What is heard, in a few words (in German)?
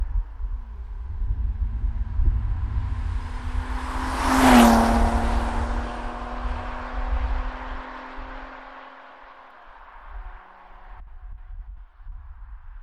6Zyl Vorbeifahren